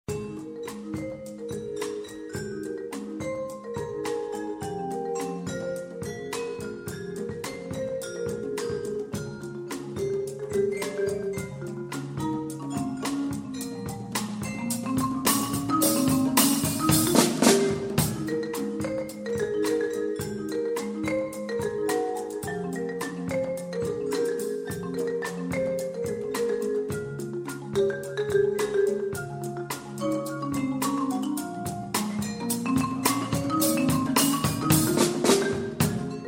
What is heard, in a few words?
Double Groove